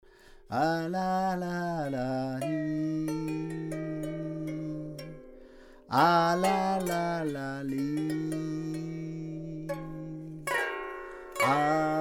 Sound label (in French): chant; La voix